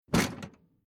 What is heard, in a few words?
Tool Box Dropped On Ground Impact Metal Rattle